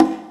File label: CONGA HIGH; E-MU Formula 4000 Series Vol. 5; Protozoa; Orbit Presets